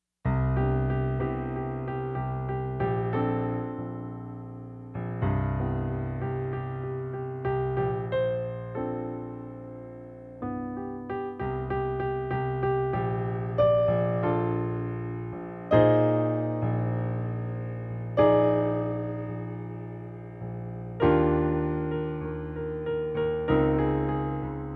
sleepy drum song

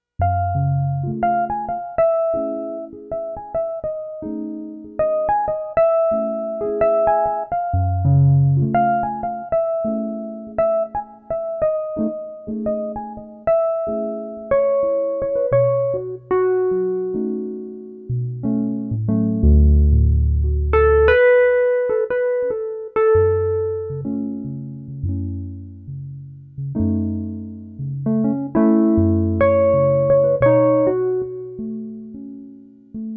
f sharp minor blues with rough bridge

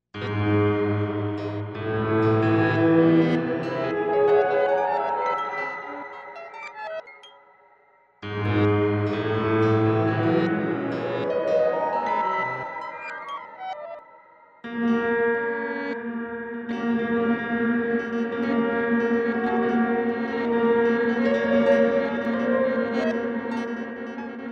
lunar piano recital

